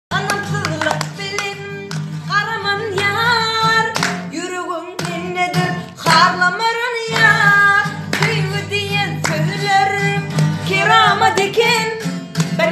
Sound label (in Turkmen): turkmen gyzy gitarany ozu yasan yaly calya